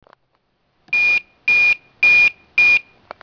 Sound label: alarm